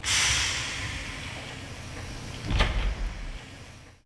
subway

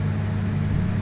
hum

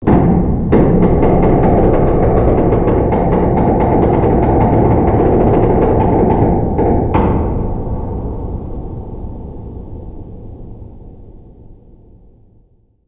ship creak low; trek

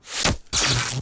staff open

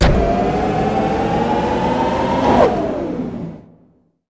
Contain Lift; trek

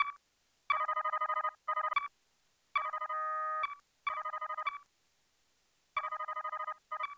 eng beep loop; trek

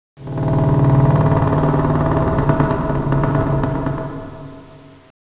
cave

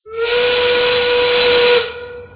steam whistle

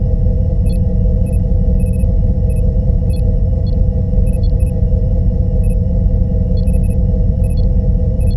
engineering; trek

